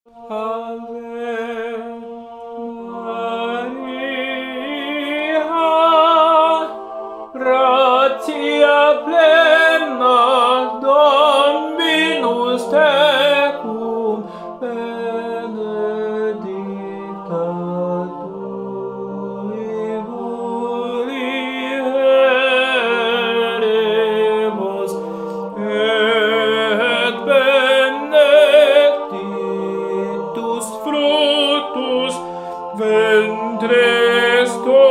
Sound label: Ave Maria Tenor